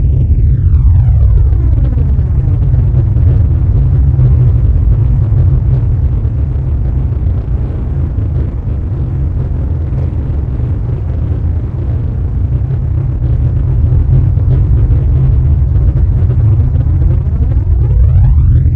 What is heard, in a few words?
vessel depart arrive loop